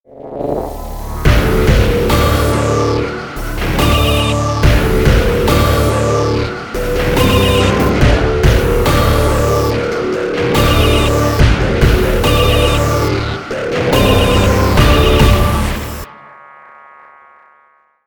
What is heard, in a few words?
Action Sequence; Comix